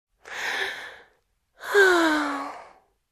Female Deep Sigh

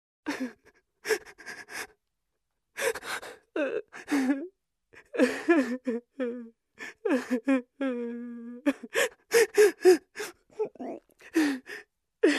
Adult Woman Crying

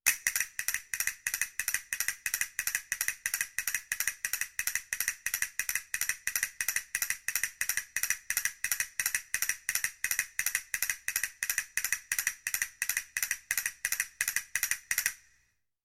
Castanets Gallop Rhythm

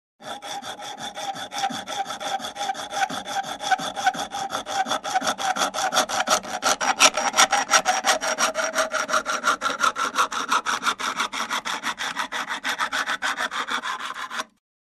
Hand Sawing a Pipe

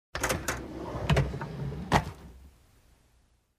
Long drawer opening

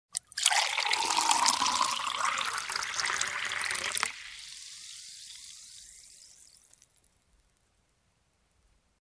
Pouring water in glass

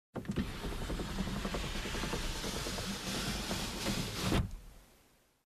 Car driving with open door and closing it

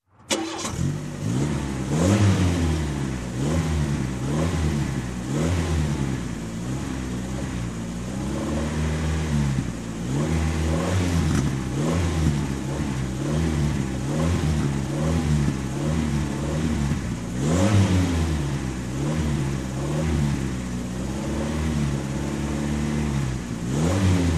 Car engine ignition and running

